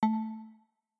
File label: cursor sound; N64FlashcartMenu